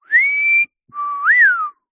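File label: cartoon whistle at the sight of a beauty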